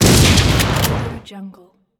a rifle gun shot; yellowcatt

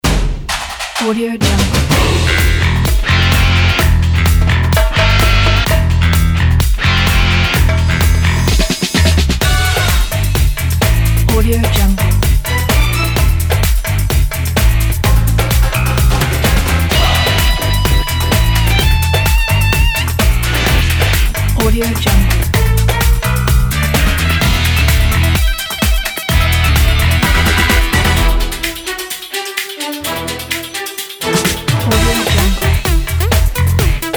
action percussive elite

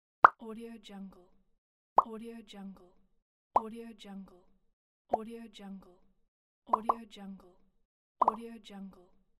plops

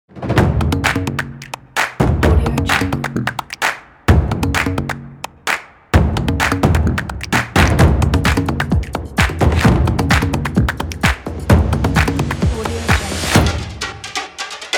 percussion

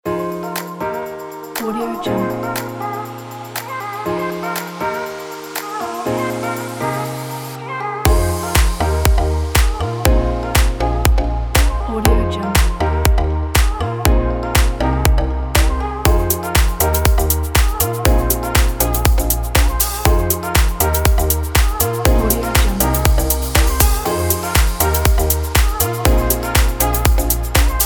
summer tropical house

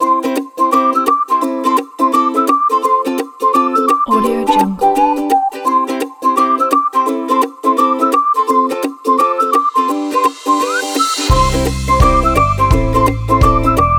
kids adventure kit

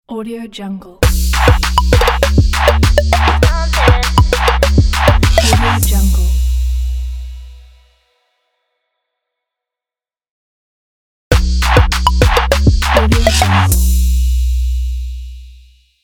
trap fresh logo

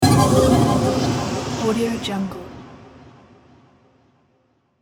horror stab jump scare

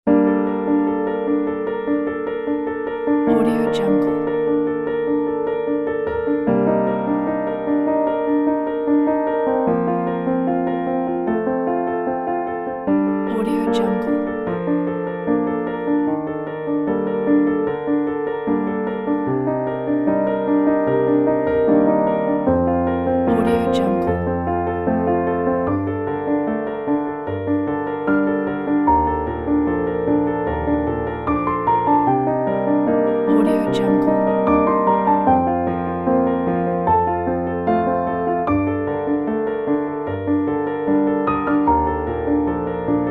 inspiring solo piano background

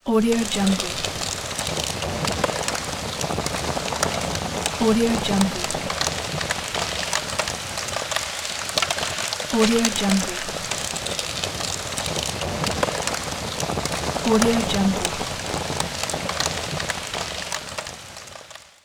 bonfire

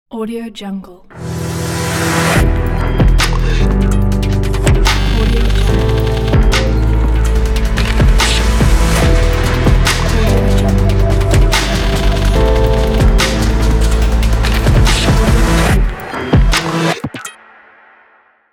glitch tech logo

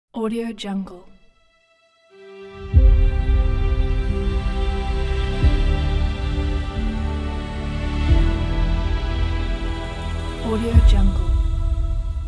emotional inspiring logo; audioatlant

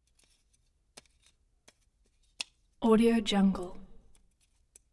ammo magazine; prosoundfx

